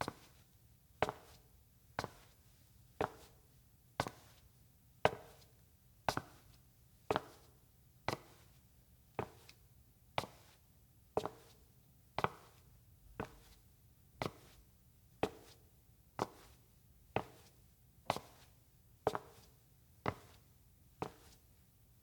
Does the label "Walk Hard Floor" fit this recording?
Yes